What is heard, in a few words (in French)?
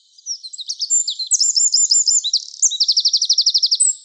Chant de troglodyte mignon